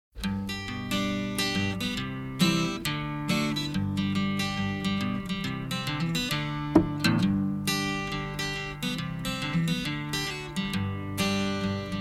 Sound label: different drum